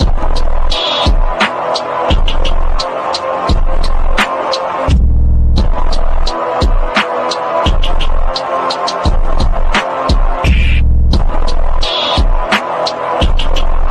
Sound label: ultra slow sexy music